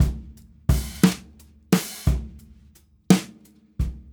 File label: Brushes SN HH